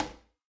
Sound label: Cajon Rimshot